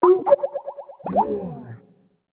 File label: file transfer failed, Skype WiFi Phone; Netgear; SPH101